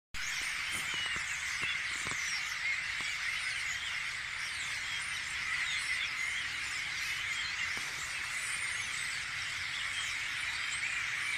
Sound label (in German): voegel